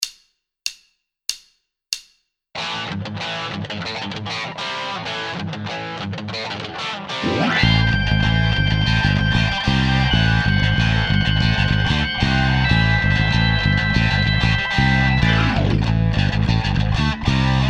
Backing Hard Rock

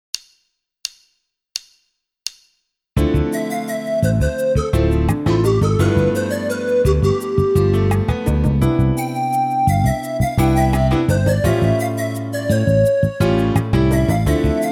Medium Fusion, V-Drums

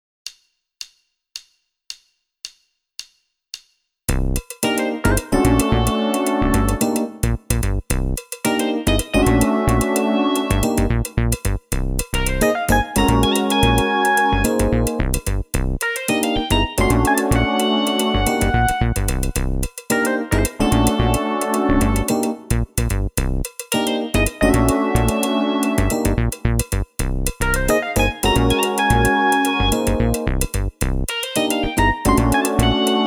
7-4 Funk, V-Drums

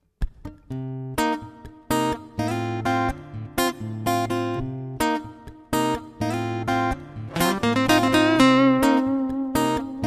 AC-33 Steel Loop

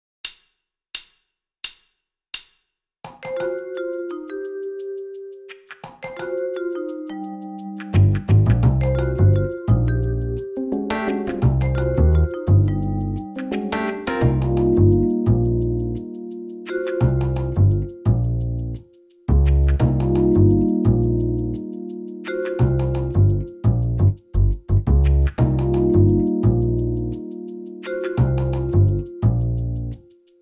Backing Acid Funk

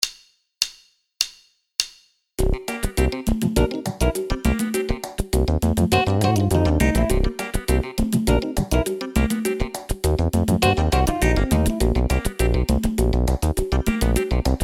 Backing Funk

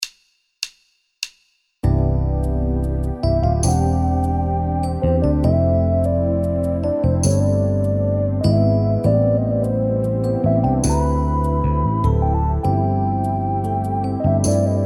6/8 Ballad, V-Drums

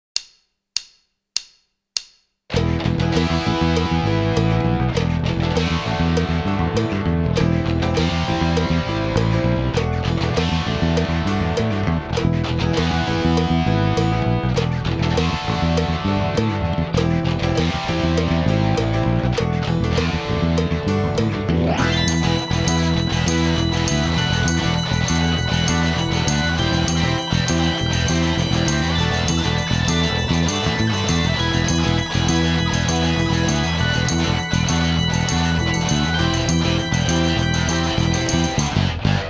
Backing Hard Rock

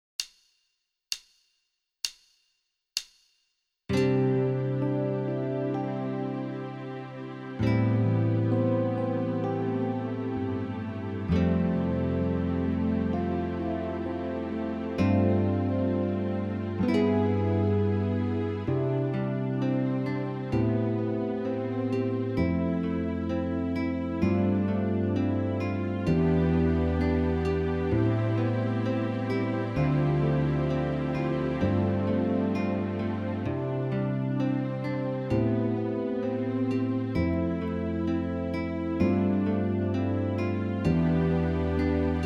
Pop Ballad, V-Drums

